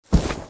Pet Cat Bound; creatures pets

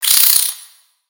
Spider Exert; creatures mechanical; Lego

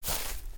Footstep Grass; creatures pets

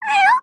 Kitty Cat Curious; creatures organic; Backpack

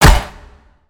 Spider Get Hit; Lego; aronwk collection; ndaudio; creatures mechanical

